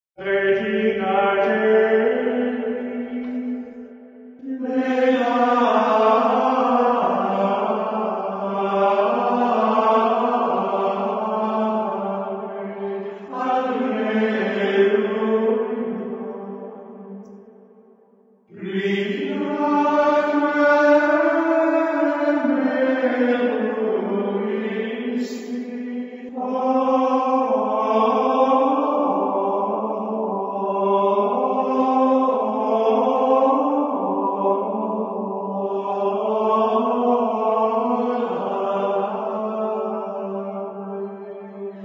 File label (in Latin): Canticum Regina Caeli tonus solemnis